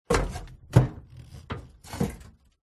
household bbq metal lid movements bangs

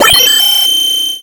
science fiction robot beeping feedback

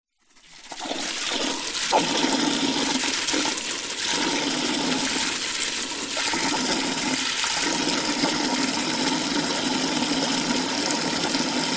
nature water spray into drain; zapsplat

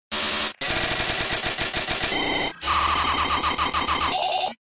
science fiction machine glitch

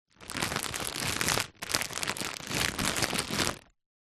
food potato chips crisps bag handling

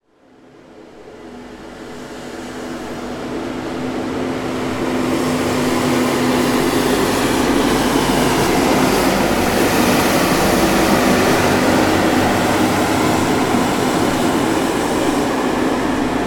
industrial street cleaning vehicle pass by

